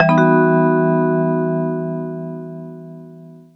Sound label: TV Suspense Vibez E, Lounge 135; Zero-G Kocktail Kollection; Construction Kitz (Lounge); TV Suspense Mixes 135